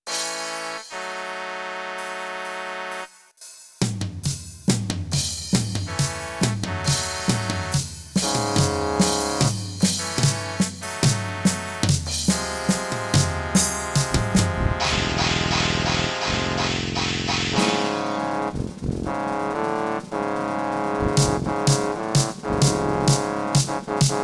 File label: drums tuba; int mic